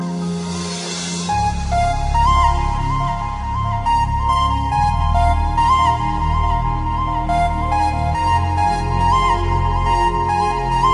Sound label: Summer Dubstep